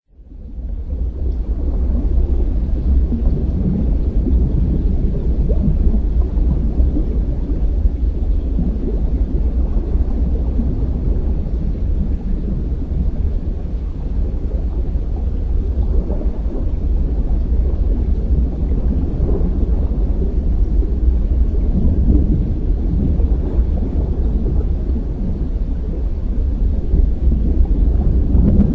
bong

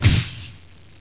door stop

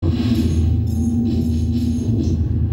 Alien amb

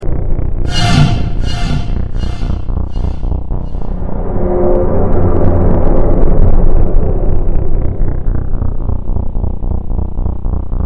ambient scary

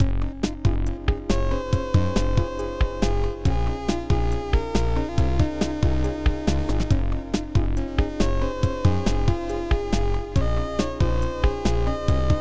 keygen song

